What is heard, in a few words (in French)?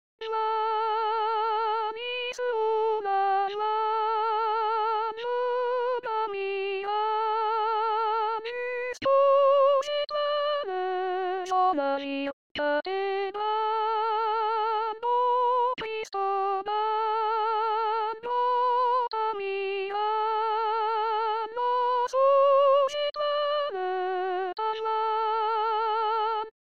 soprano, chorale